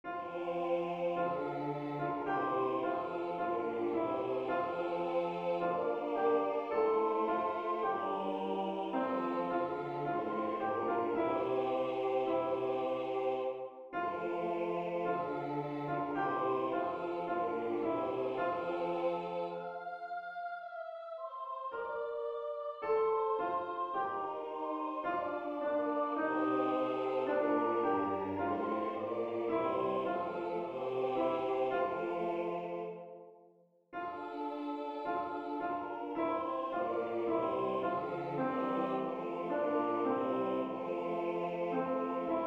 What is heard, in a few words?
alto tutti